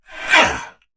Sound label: Attack Effort